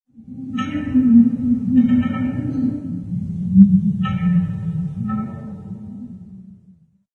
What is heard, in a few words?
pole rattle; random amb sfx